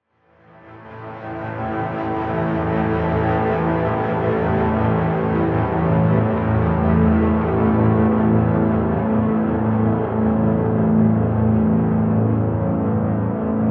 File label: incoming storm pad